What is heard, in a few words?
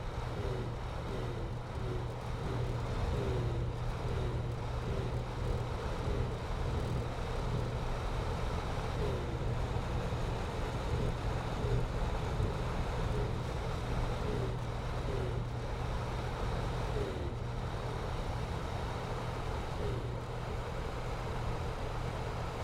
Ventilation Fan, small box, metal, electric motor, disconnected, whirring, spinning, malfunctioning; power dips; Eiravaein Works; Vaeyan IV